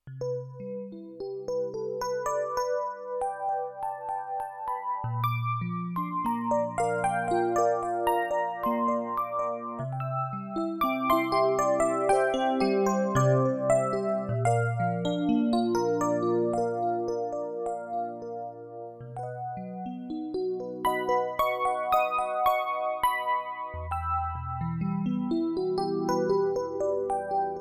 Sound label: Epiano